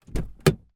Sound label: car door open internal peugeot 307